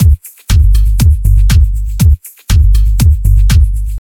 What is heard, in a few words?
slow tech kick and bass groove; drum loops